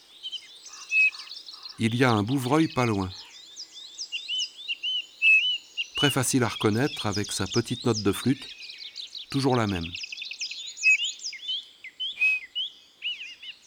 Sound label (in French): Bouvreuil pivoine; ABC des chants d'oiseaux